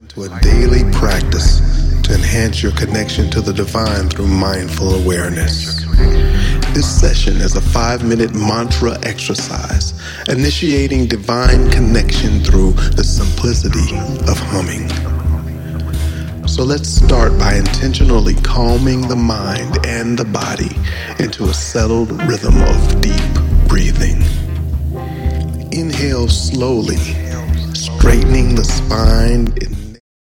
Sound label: hum meditation